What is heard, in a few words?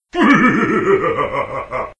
Halloween Ghoul Laugh Sounds Car Horn